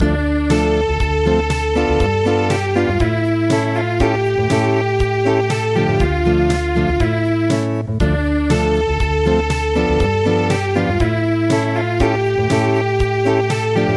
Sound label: Norwegian Lullaby Acoustic